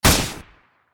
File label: shot